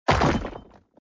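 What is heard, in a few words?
explosion; chicken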